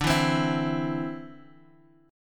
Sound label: D 7th Suspended 2nd, Guitar Drop D